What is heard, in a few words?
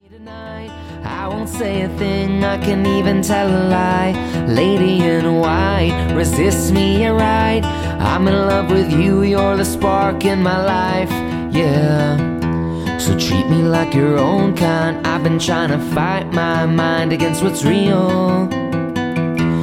Acoustic